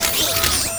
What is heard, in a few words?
Mine Walk